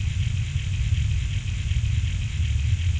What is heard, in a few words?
stickybomb idle; stickybomb white